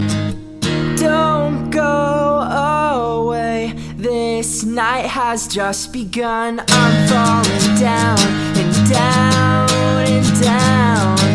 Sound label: Accoustic